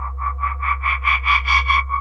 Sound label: hover; robotron